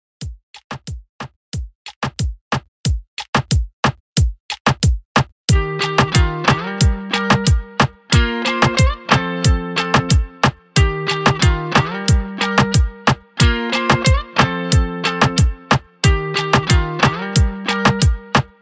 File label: MIXSHOW REDRUM 91 BPM